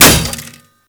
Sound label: Bullet Impact Metal No Whizz; BulletImpacts; DE; Warframe